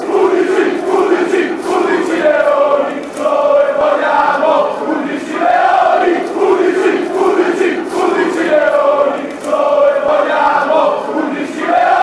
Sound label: fan chants